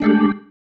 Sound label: Stab One Shots, Organ